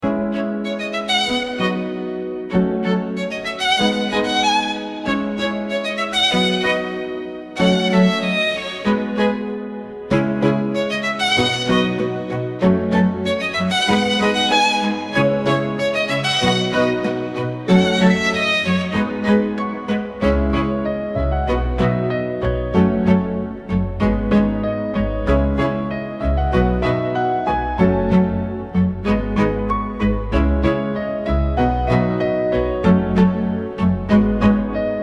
uplifting classical loop

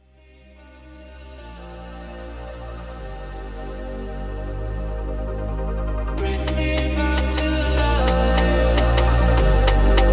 phonk

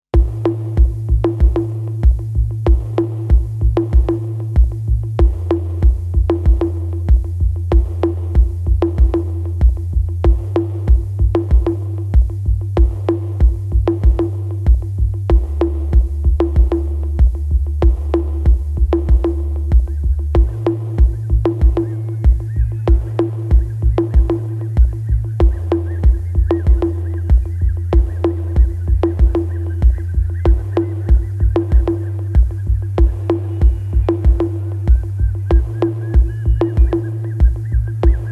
Just Sine Waves

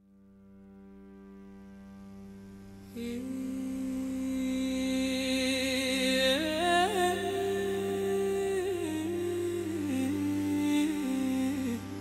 Ukrainian Folk Ethno Techno Remix

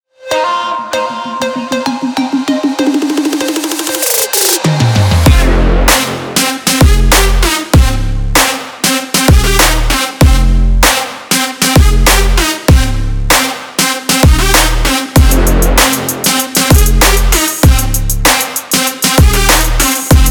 Trap Fashion Bass

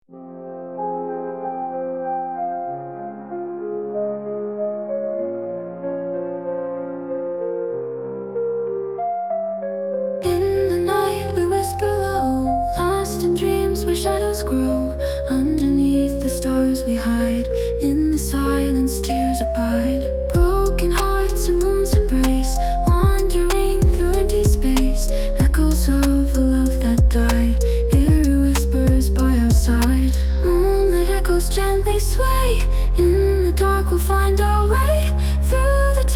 moonlit echoes edm

